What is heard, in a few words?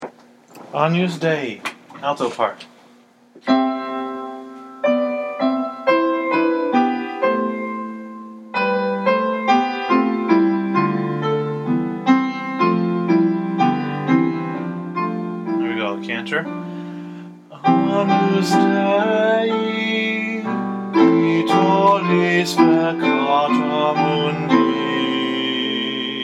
alto; agnus dei mass xviii